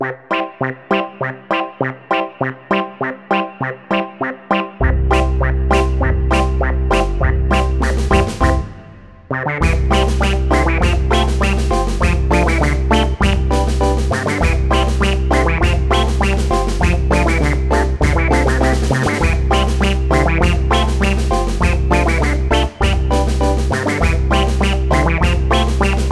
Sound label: Duck march